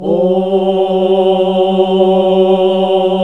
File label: OOH G, NUNS AAH OOH; Sound & Vision - Gigapack II